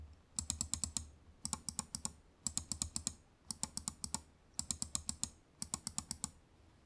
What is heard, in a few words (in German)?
Links und Rechtsklick; Glorious Model i